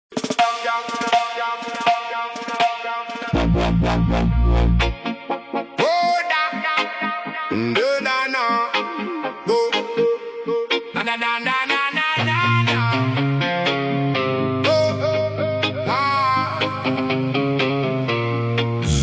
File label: ocean vibe reggae bass boosted